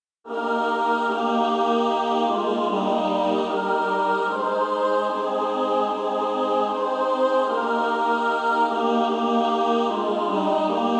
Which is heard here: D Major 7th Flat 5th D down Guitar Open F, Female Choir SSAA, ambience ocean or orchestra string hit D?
Female Choir SSAA